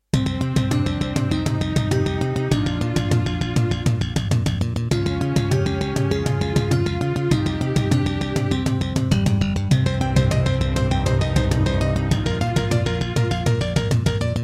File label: Roland SC-88 Pro 88 MAP; Introduction; Hocus Pocus